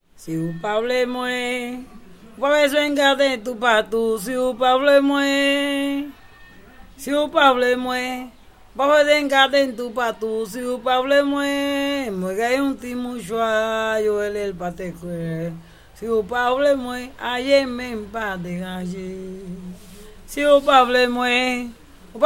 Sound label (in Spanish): merengue